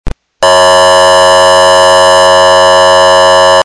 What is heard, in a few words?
Airhorn; code 3 mastercom